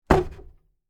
Door Close Cellar, Foley, Door, Close, Cellar, Door, Wood, Planks; Miscellaneous Doors; BlastWave